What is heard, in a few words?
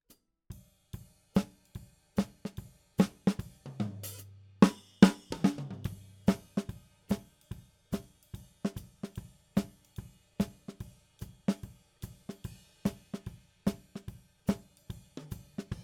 SNR top